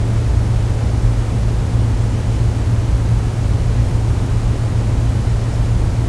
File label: tunnel tone loop; ambient, ambience; Left 4 Dead 2